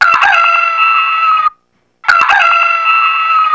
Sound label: rooster